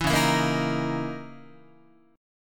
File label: Db Minor 6th Add 9th Db down Guitar; strummed; 7,9,7,8,7,9; 4A,4E,5A,5D,5G#,6C#